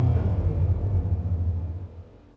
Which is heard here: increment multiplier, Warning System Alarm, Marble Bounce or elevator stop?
elevator stop